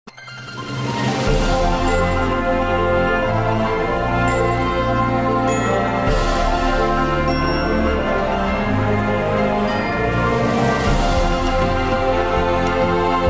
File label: Orchestral Remix; Pokemon HG⧸SS